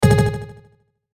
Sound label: Hit Warble; Hit Warble Delay; Blastwave